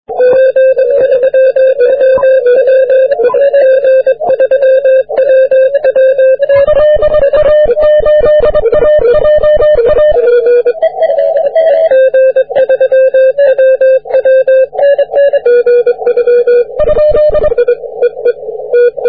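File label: 40m continuous wave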